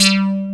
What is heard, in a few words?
HALIOSIS BS, BASSES, Synthsonic; Pro-Rec - Synthsonic